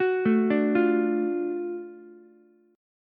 F sharp Minor Major 7th Sharp 5th F sharp down Ukulele; arpeggiated